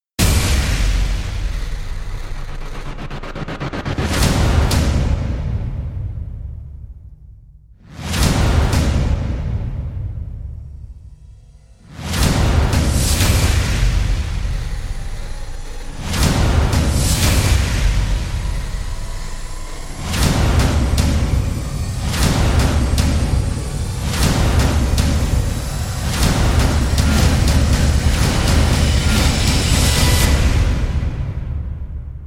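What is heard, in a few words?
trailer backends percussion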